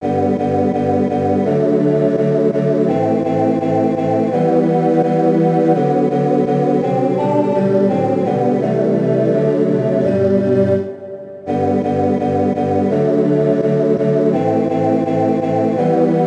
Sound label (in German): altirischer segenswunsch ttbb; chorpartitur